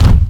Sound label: Kick; RnB; Takebeat